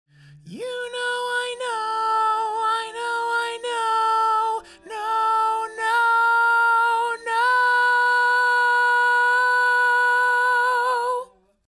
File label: tenor